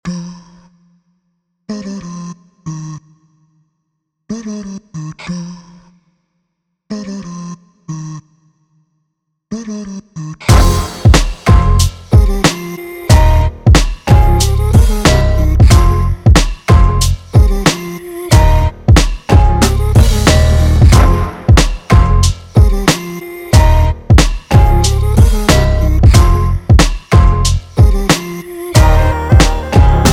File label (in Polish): beat